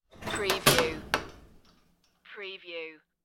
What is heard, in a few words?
close locker door